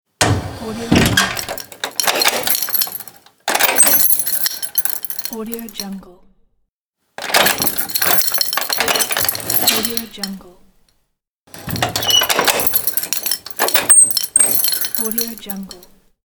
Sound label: door lock